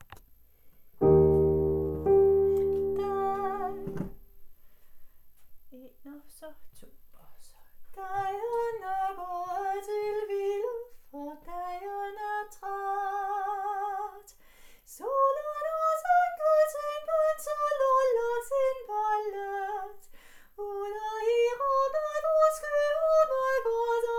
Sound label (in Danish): SOPRAN med sang